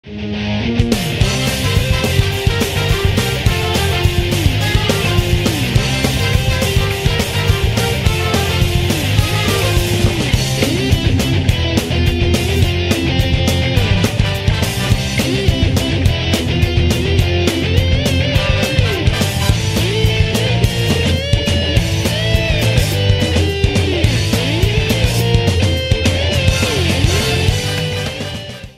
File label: metal harbor ringtone